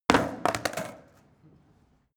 skateboard indoors drop; sport freizeit

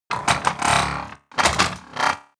transformation rattle hum

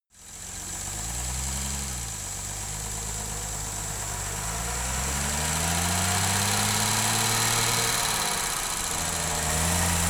car jaguar e-type drive engine

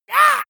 monster assault high; schreie

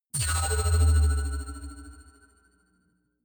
sci-fi magnetic sling big high; energie

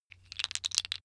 tiles wood rattle; objekte; holz

